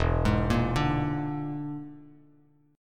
E 7th Flat 5th, down, Bass Standard; E7b5 arpeggiated; JGuitar

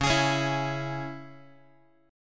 Db Minor, Guitar; strummed; JGuitar

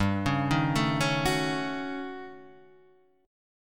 D sharp 7th Sharp 9th Flat 5th

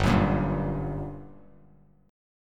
G Minor Major 7th, Bass Standard; GmM7 strummed; JGuitar

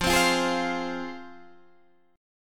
F Minor, down, Guitar, Half Step Down

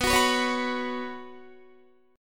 B Suspended 2nd, Guitar; Bsus2; strummed; 19,21,21,18,18,18

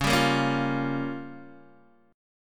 Db 6th Flat 5th Db down Guitar DADGAD

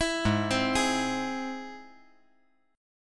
G sharp Augmented G sharp; G#+ arpeggiated; 9,8,8,11; JGuitar

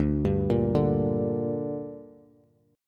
D Suspended 4th Sharp 5th D down Bass, chord; arpeggiated

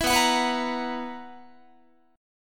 B Major 7th Suspended 4th B, Guitar; strummed

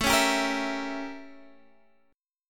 A 7th Flat 9th; A7b9 strummed; JGuitar

